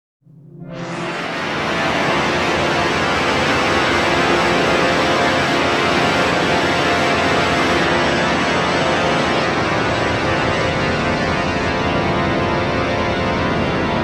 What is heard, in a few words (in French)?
flux volumina pour orgue; Ligeti